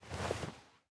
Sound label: armor; smite; baronsamedi skin7